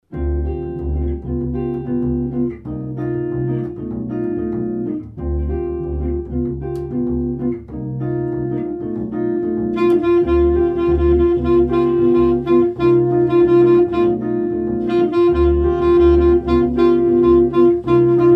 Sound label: Jazz Trio; Netherlands Jazz Trio